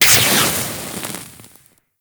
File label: Infested Pistol Gas Burst; Weapons